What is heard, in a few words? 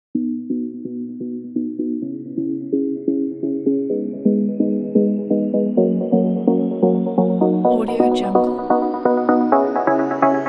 summer melody